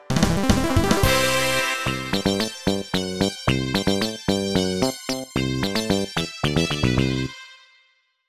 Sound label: Level Complete; Beetle Bug 3